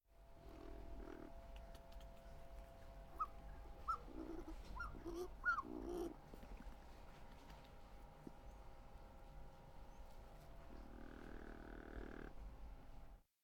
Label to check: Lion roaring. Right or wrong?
Right